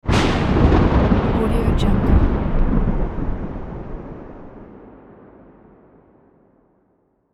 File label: thunder